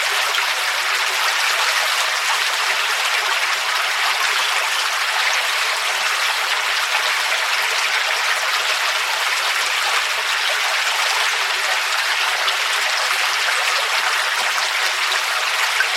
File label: dragon Fountain Loop; Keepsake